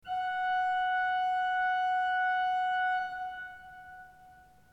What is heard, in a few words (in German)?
ton, orgel